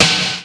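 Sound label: snare; xr10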